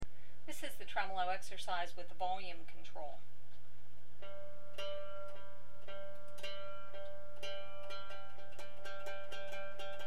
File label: Tremolo Exercise With Volume Control, mandolin